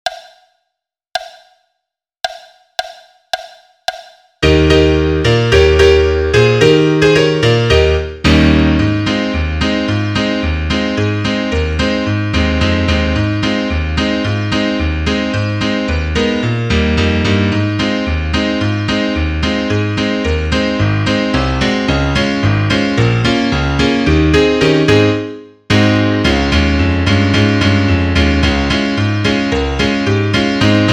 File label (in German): Klavier Solo, trompete; Kentucky - Step